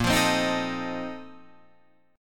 Bb Major 7th Suspended 2nd Suspended 4th, Guitar, Open F; strummed